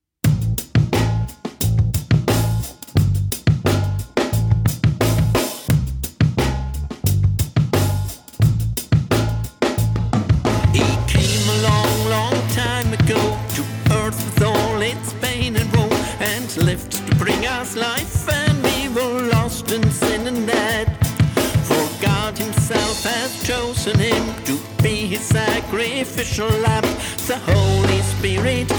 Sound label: music ballad of jesus